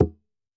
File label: Rebolo - G#; percussion; Cymatics - Infinity Beta Pack 2.0; Zeus Percussion Collection